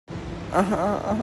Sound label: ahhhh